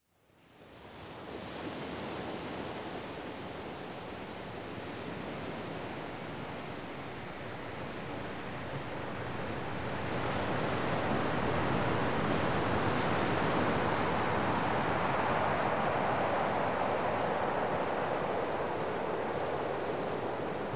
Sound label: artic ambience